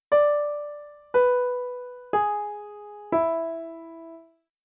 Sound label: Treble E7 down